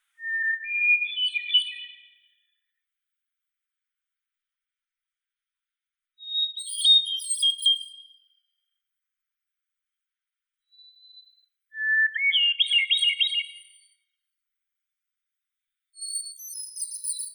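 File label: hermit thrush, Catharus guttatus; drozd otshelnik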